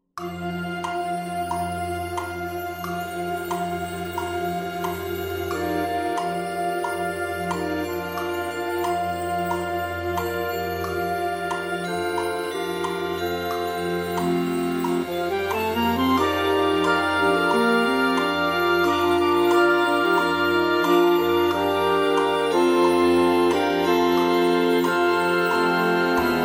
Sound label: with Click and Samples